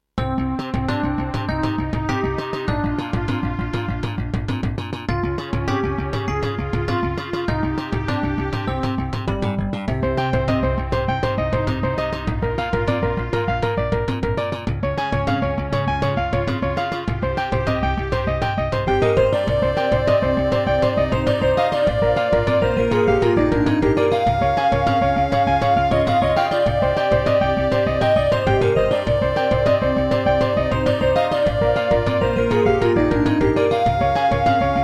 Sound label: Introduction Gravis UltraSound; Gravis UltraSound 1MB Rev.3.4; Hocus Pocus